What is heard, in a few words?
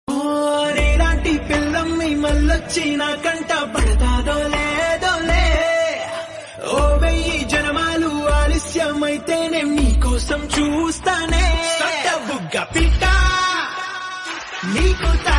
Telugu Song Ringtone